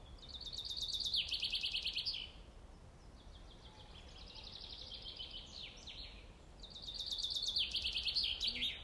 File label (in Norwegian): Bokfink